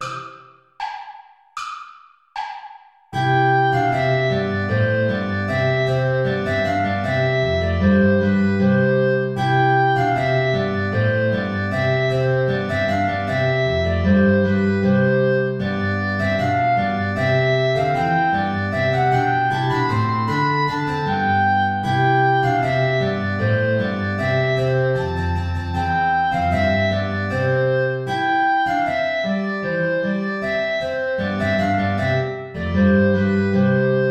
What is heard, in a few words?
play along without guitar; traditional